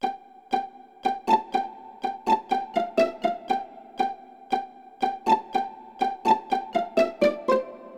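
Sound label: violin pizzicato; Smeshariki Chiko's Computer; Skripka Piz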